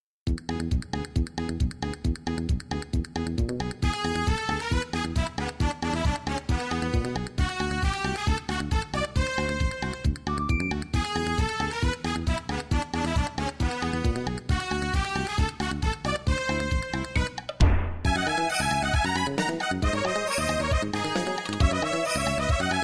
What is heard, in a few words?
Bowl